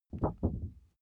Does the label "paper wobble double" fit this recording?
Yes